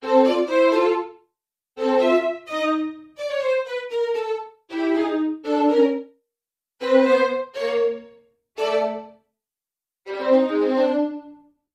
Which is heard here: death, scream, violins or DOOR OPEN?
violins